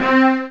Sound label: Bowing; Retro Synths; Vector; Components; Vector Attacks